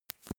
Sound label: makeup cotton stick clean; haus-garten; badezimmer